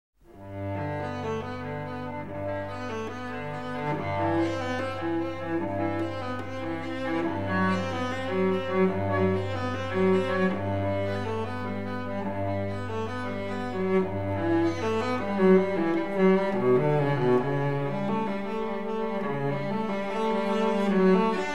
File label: Cello Suite No. 1 in G Major, Prélude; BWV 1007 I.